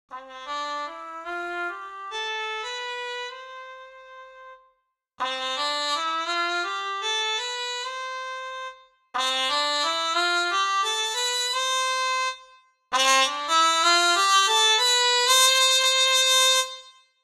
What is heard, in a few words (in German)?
Trompete Cup